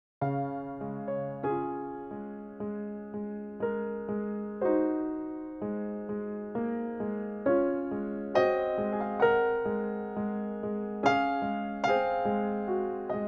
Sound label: Raindrop Prelude